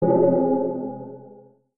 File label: templar die sound; Arc of Templar